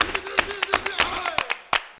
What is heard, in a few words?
120 CLAPS